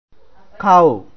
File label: cow